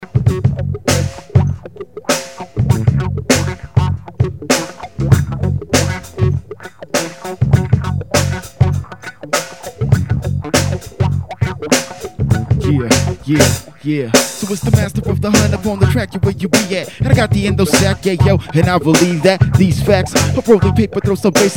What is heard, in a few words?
warm up track; In studio Recordings; South Boulder Studios; Rogue Sound